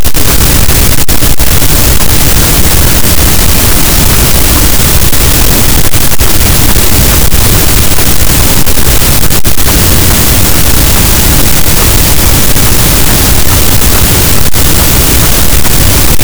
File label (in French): cachalot positif, Physeter Macrocephalus, gaussian noise